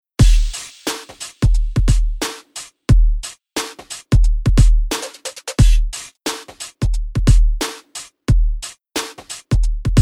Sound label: Lo fi Processor After